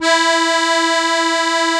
ZUPAN CHRO, AKKORDEON; Best Service; Alpine Volksmusik

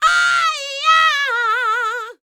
D YEAH, Vocal R&B Dance; DB DANCE

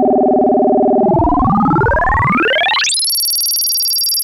Osc vs Ring Mod, vintage oscillator; radiosonics

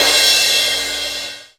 Crash, drum machine

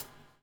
BUBBLE; Spectrasonics - Backbeat

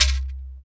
CHEKER, ETHNIC PERC; Roland LA

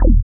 BASS, Tekno Industrial; East West

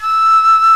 GUITAR FLUTE

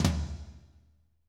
tom, Pop Country, Wet; Northstar; Drumscapes Roland

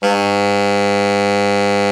TENOR, Sax; VEL-SW; Giga Samples Collection

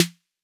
808 S, Roland MV-8008; Roland MV8800

